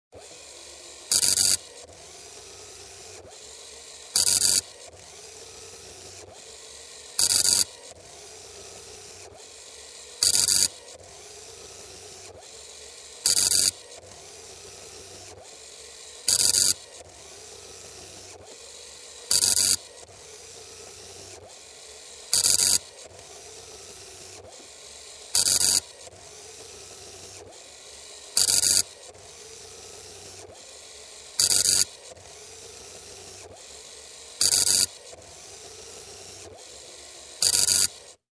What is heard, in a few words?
Printing Buzz Sound